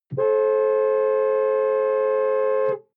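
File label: sports car interior horn single long; verkehr transport; autos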